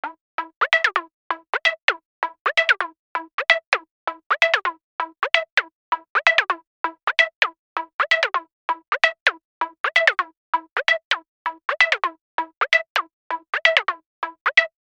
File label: SYNTH